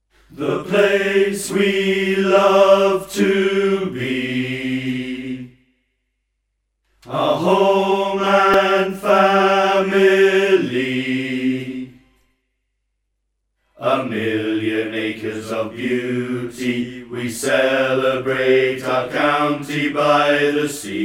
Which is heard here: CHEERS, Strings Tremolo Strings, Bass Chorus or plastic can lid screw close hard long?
Bass Chorus